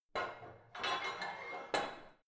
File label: barbell heavy plate put on; sport freizeit; fitnessstudio